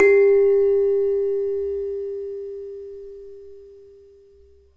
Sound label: Demung